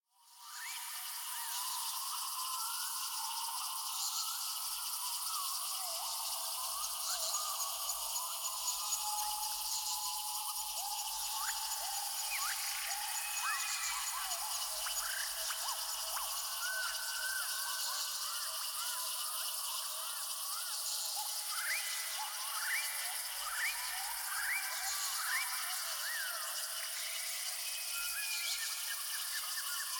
Jungle Day Atmosphere - Various Birds Songs, Crickets; Hollywood Edge; sound designer's toolkit v2